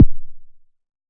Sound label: popper, Kicks; single hits